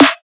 drum snare connect; Virtual Riot; Drum Hits

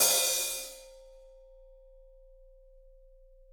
HH, Drums; Bob Clearmountain Drums I

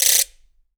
RATCHET

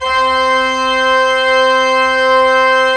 ORG, CHURCH ORGAN; Roland - LA Composer vol. 1

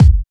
Bassdrum; Best Service; House Definition VOL-1

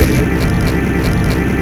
HIDEOUS, HIDEOUS HIT; Spectrasonic Distorted Reality 1

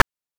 PITCHED NOISE; NES DPCM; MIDINES

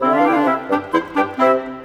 Cinematic Winds; Sonic Foundry (Sony Creative Software); Orchestral Series VOL-3; Cinematic 20

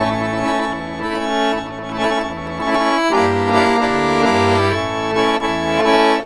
ACC, Balkanish Traditions

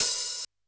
Analog HH Open; Yamaha PSR-E323; Dance Kit

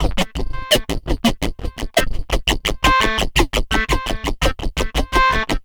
Rings in Time, Synth Sequences

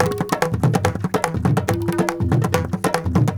India, Heart of Asia

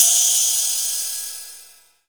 ZYMBAL, PERC; NorthStar - The Wizard VOL-2; TSAR PERC

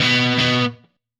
Happy Pub Guitar; Sonic Foundry (Sony Creative Software); Indie Rock Anthems; Session 05 - Happy Pub

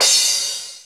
ELEC crash, Drums; ELEC.KIT; USB Soundscan vol.11 - Drums Dance & Groove; AKAI

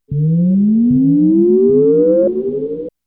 ELECTRO B, Techno Trance; E-MU Formula 4000 Series Vol. 2

